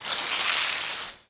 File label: Water Hose Spraying A Tree, Hard Start